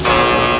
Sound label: buzzin; israel